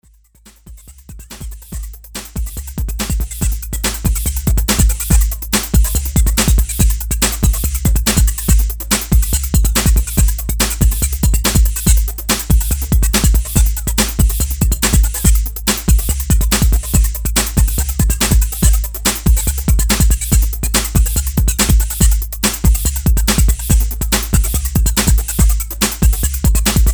Techno, inst

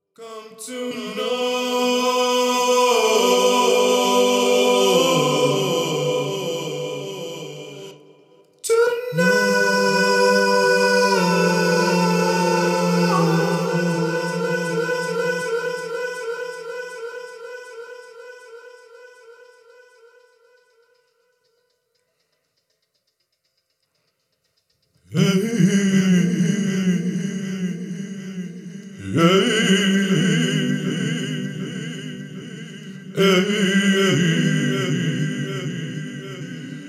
acapella

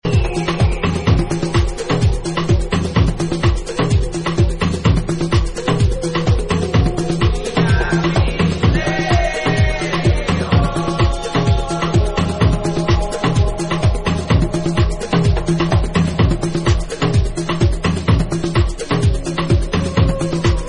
drums de fuego

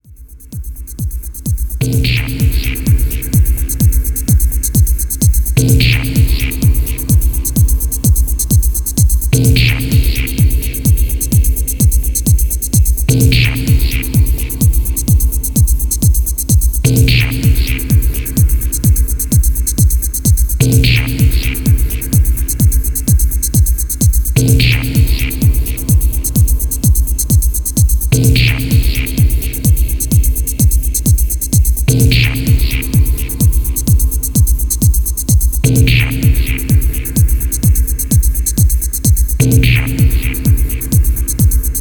Ringing Bass

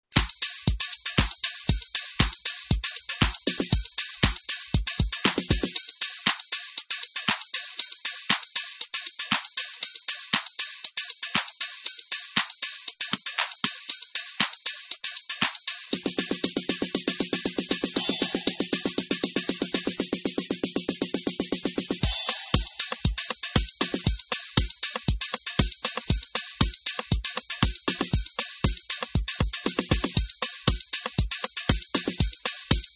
Snare Drum mix

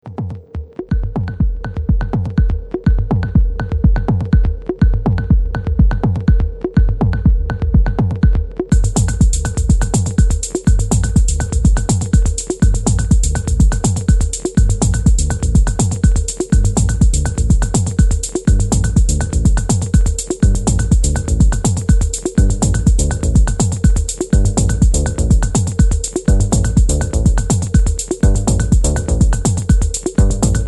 drum; Secretsundaze

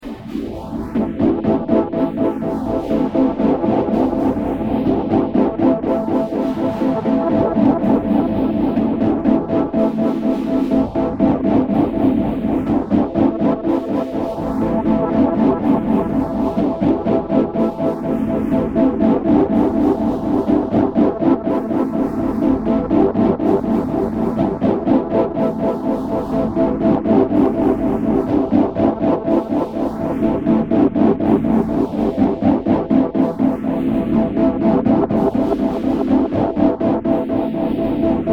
ambient

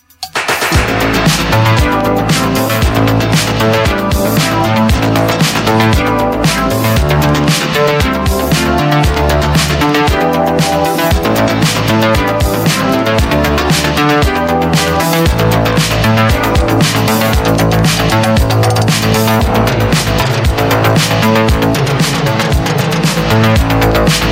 Over dub instrumental

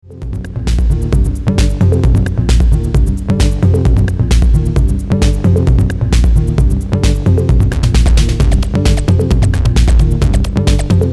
tr808 echo beats

